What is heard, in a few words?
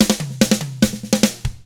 drums, 50s rock; ILIO; Double Platinum Drums 1